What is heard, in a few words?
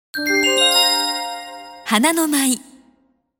Soundlogo